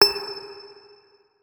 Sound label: notes, guitar; Hideout Furniture